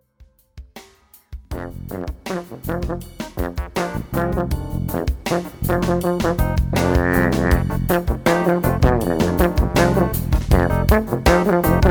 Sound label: Fusion Quartet